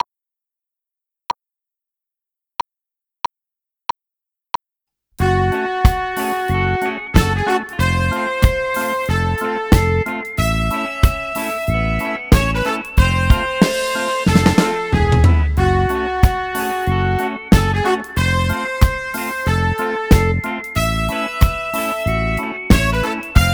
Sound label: Bodie State Keys Version